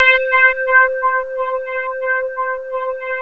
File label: ECHO SYNT; East West - Global Sounds; SYNTHEX